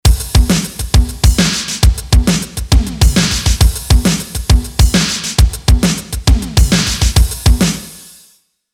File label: Dynachord ADD Contem Plate